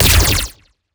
red lightning strong

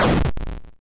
explode; horizontalrunner

